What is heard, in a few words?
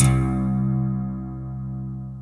C2, SynthLine; 90 s Sample CDs; NiceBeats; SynthLine VOL-33